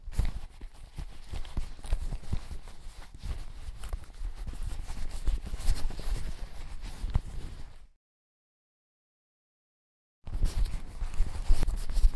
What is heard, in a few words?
purse - handle, drop; human; NS Studios - ultra sound collection